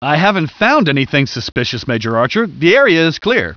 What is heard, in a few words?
landing area peace; gearbox; gunman